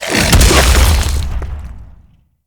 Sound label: Infested Femur Slam Impact